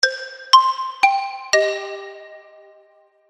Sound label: Xylophone Horror Arpeggio, Musical Sound Effects